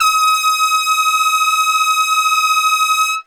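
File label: D#4 trumpet straight, brass; Best Service; Brass Super Section; TRP STRGHT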